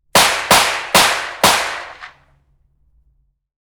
Mini Uzi 9mm 30m in front off axis clean Four shots